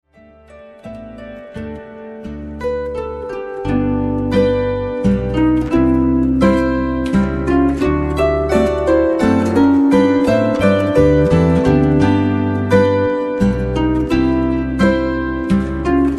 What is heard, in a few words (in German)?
kaltes feld walzer; stubenmusik; echtschbassig